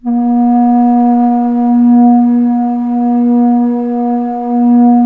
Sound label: BASS, bass flute; USB Soundscan vol.23